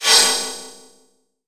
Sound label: multimedia rollover; sound effects one